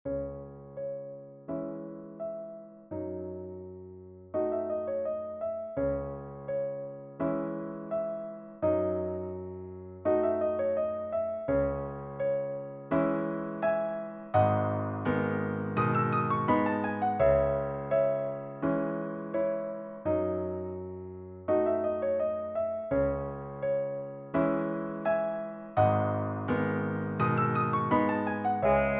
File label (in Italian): Duo Per Sassofono E Pianoforte MIDI